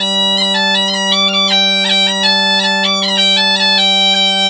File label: G BP, Celtic Flavours, BAGPIP080; USB Soundscan vol.42; AKAI